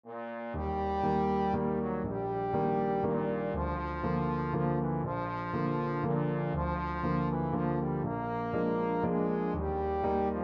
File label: trombone